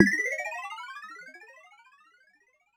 Mega Drums, Korg 01W

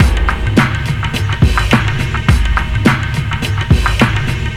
venus drone, 105bpm

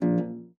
STOP A1, HARP; Xsample VOL-8; HARP+FX

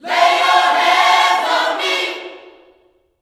LAY HANDS, Vocal Planet Gospel

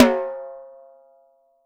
6X12 WOOD, drums; Living Drums

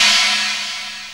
RIDE, CYMBALS

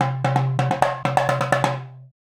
Indian Drum; Sonic Foundry (Sony Creative Software); Essential Percussion; Drums

